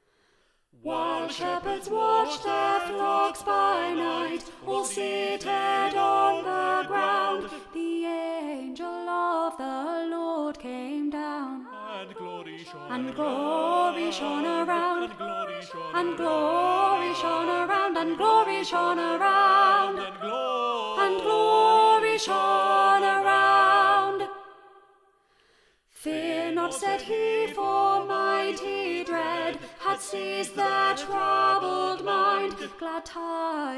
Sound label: Alto Up, Old Foster